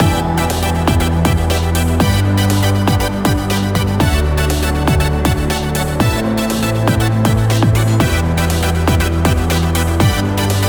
sci-fi puzzle title bpm120

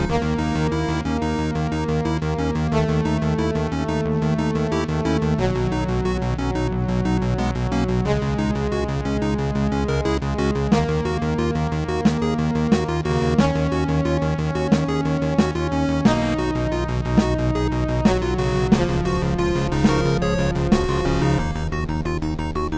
final stand phase

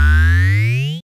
anger shoot; chicksword

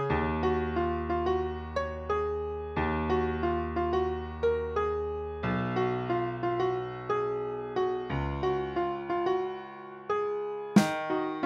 piano

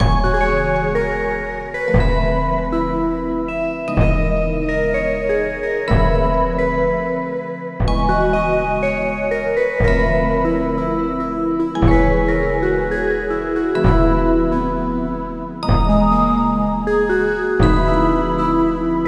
jazz synth

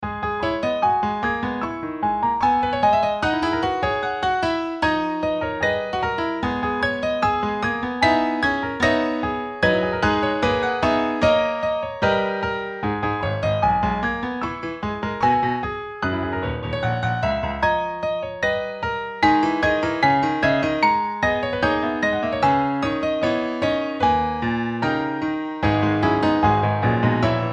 piano

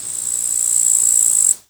cricket chirping fast; loopable

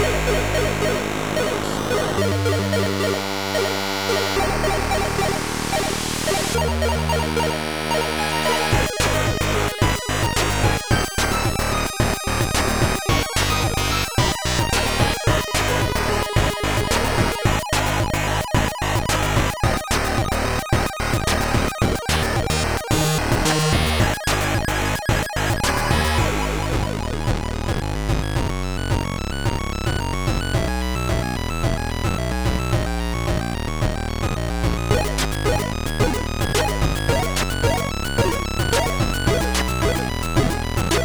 bleeped

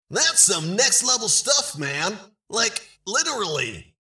next level; EL DUDE - Video Game Announcer

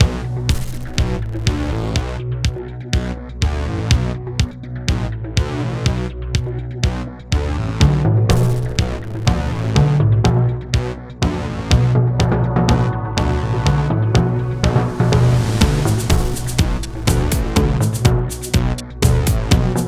going undercover loop

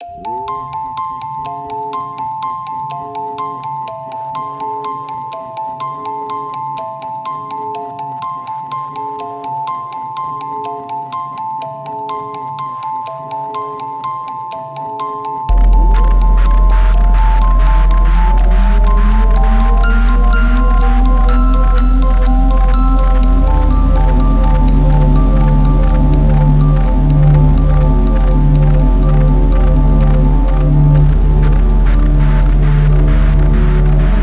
Bell Hell

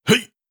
effort grunt male

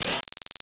press

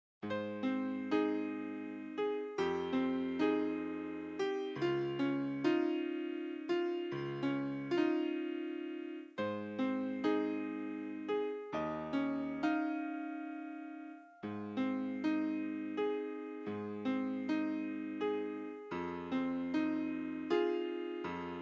piano melody solo

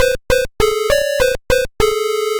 total fail

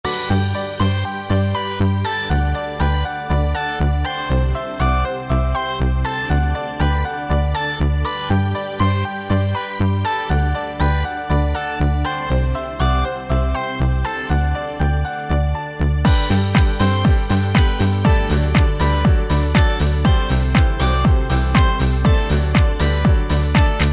It is a musical style children dance sequence; Children games on the beach

